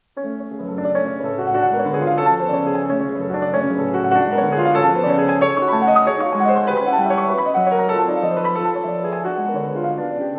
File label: Preludes; Chopin; Op28; Lympany